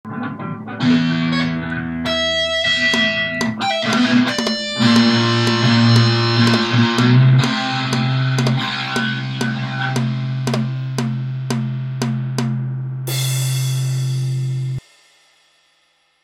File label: intro music; dans comedy special; pacificpelican podcast